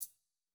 hihat

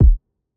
Jazz hop kick

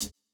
Jrock hihat

